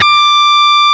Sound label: DIST GT D5, GUITAR; AKAI S-Series CD-ROM Sound Library VOL-8